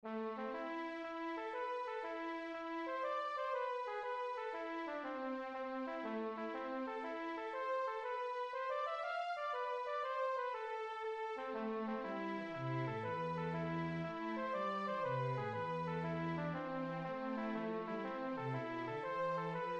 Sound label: mazurka; Tégoric